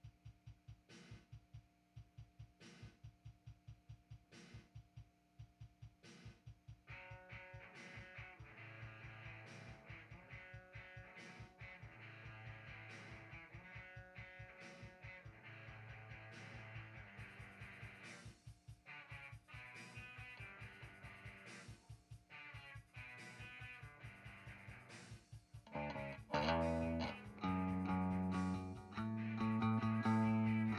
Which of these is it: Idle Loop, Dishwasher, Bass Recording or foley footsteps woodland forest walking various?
Bass Recording